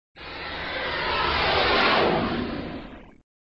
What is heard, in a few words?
Sound SFX, flipper; sttng